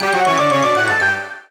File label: Stinger; Ringling Bros. and Barnum & Bailey; Wii